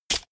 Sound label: medieval click light negative